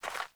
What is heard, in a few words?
Beaver Footstep, Foley; Animals; Ambient